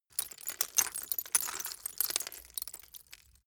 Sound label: glass debris fall sequence short